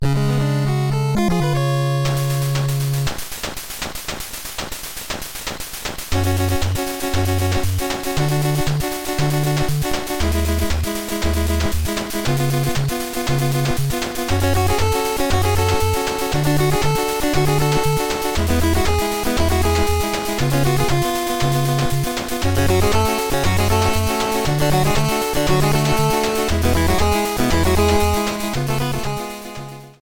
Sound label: 8bit